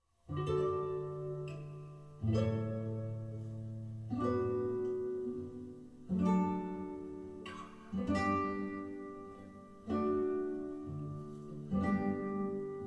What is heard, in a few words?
canon classical guitar